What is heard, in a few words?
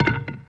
elec wood; drums; one-shots; electric